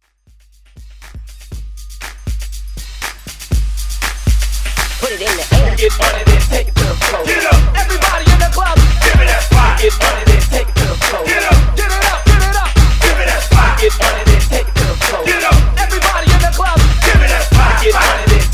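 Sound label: Party Break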